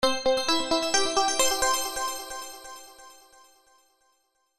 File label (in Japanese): pinponpanpon